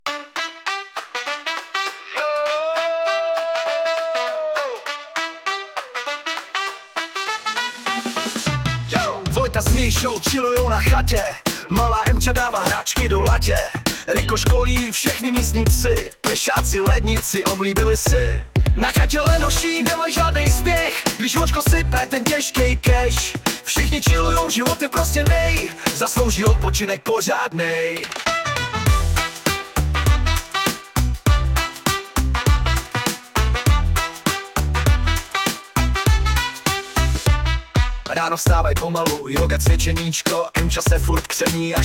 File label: funky rap